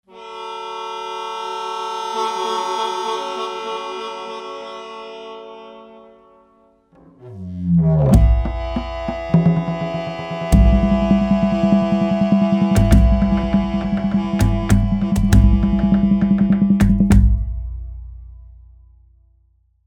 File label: Orguette; commune